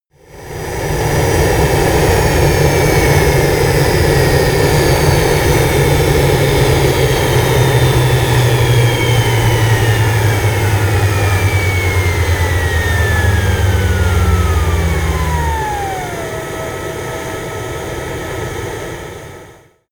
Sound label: plane cessna private turbine slow down; flugzeuge verkehrsflugzeuge; verkehr transport